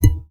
Classical Guitar Extended Technique

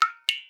Drips

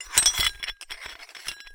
Hole Saw Rattle, Hole Saw Bits

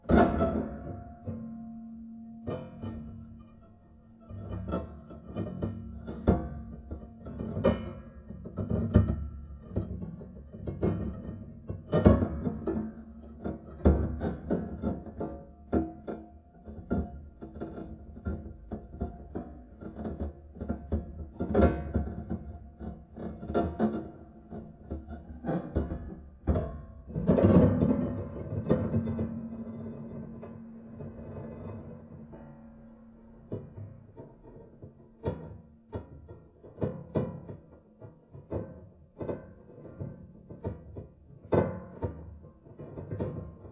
String Rub Erratic Very Long, piano; Piano Miscellaneous

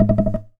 Classical Guitar Bounce; Classical Guitar Extended Technique Stereo

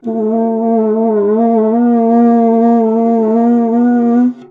Cuica; Percussion Samples

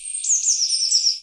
Birdsong Bit, Oxford Island Lough Neagh

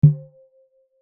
Cuica Hit; Percussion Samples

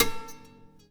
Classical Guitar Extended Technique Plink

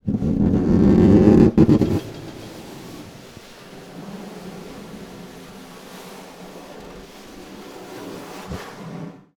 Snare Drum; Percussion Samples

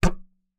Melodica Short Note

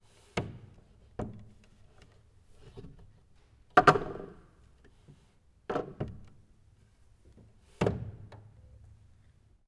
Music Stand, Piano; Piano Miscellaneous

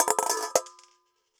Coin On Collander

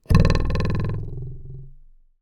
Ruler

